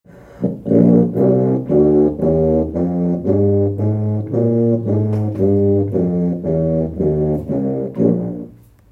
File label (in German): So klingt die Tuba